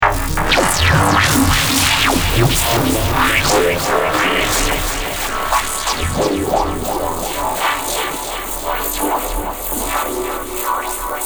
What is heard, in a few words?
weirdest bass into arp D Major 130